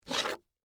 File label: High Heels Thick, Footsteps, High, Heels, Thick, Heel, Scrape, Wood, Surface; Footstep Sound Effects; Blastwave